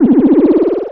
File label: Misc Synth FX; musicradar; cartoon caper